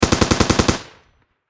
Warfare Machine Gun 7.62 Caliber M60 Long Burst Close Perspective